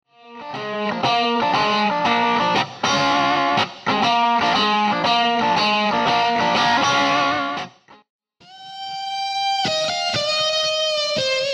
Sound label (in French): electrique, guitare